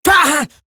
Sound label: pain, female